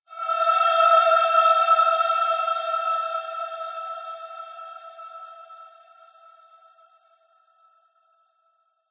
accent musical sigh ring crystal metallic breathy detuned slightly ghostly; cold; skyclad sound